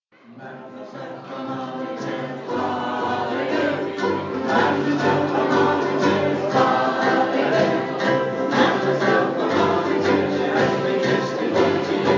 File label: ukes